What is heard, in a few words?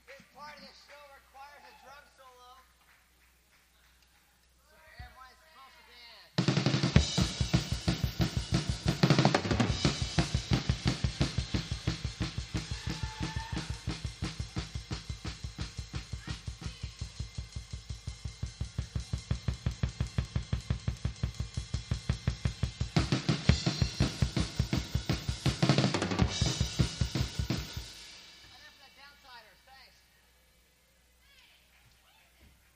Drum Solo